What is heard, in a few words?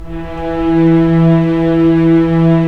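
STR SC, string section; Roland - LA Composer vol. 1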